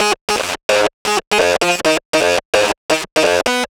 Geetar Stack, Underground UK House & Electro, Synths; Sonic Foundry (Sony Creative Software)